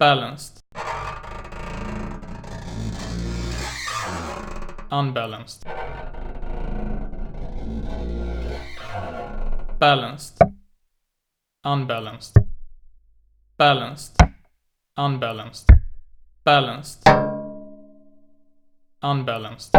Contact Mic Balanced Triangular vs Unbalanced Circular